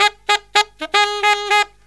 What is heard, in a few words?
SAX, Killer Brass Riffs